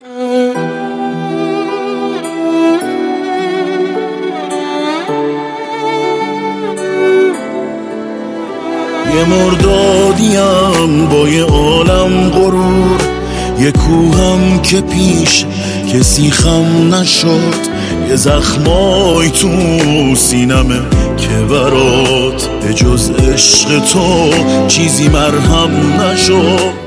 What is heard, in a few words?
Ringtone